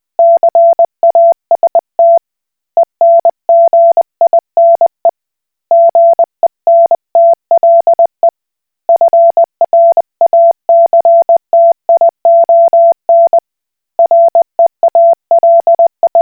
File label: Koch Morse